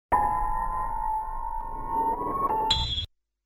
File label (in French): synthese